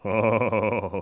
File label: ohhh; Wired for Sound Pro CD; Aristosoft, Inc.; homer